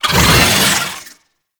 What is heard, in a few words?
Lunge Cast Vocal; Warframe; Oraxia